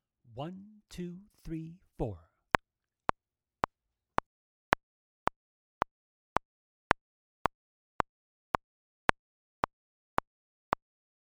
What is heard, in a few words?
Metronome with two bar gap 110